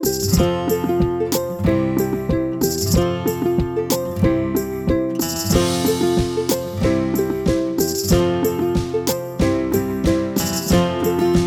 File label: (no melody)